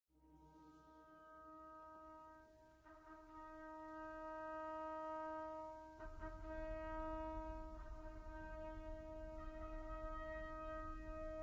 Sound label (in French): TROMPE